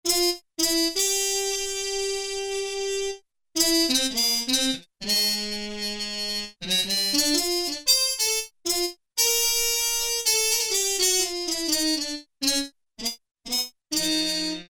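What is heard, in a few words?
Harmon Trumpets; Brass And Woodwinds; Roland L-CDX-03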